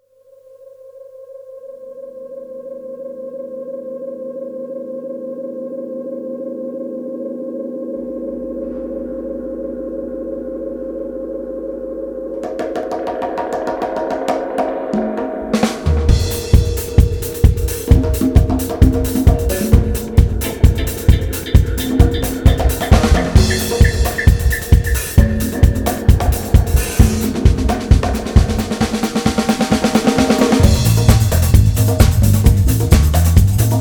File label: Funk Dub